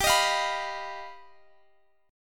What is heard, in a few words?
G Augmented 7th, Guitar, Half Step Down